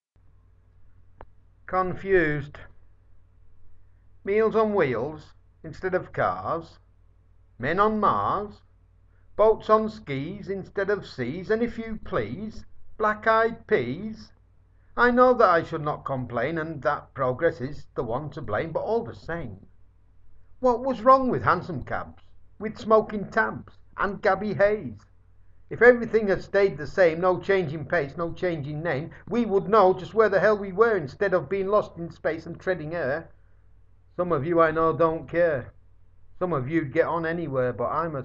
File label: confused